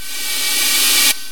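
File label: reverse cymbal, percussive; gmplay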